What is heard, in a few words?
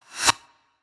Short Swoosh Transition